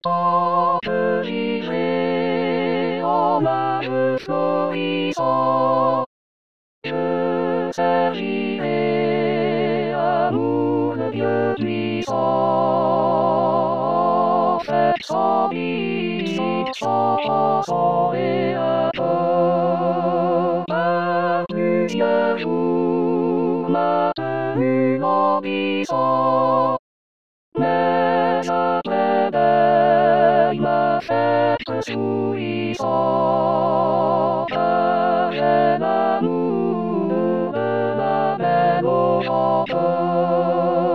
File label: sopran; rimailleurs